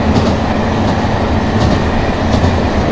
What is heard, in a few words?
run, Train cl158; OpenBVE